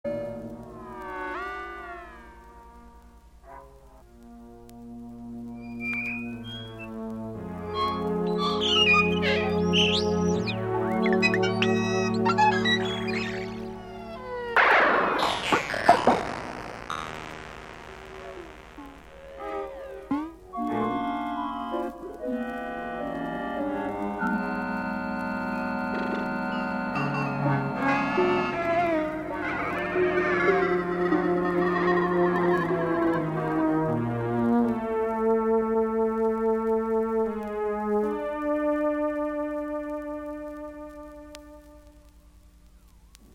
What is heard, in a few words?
Noise Electronic Music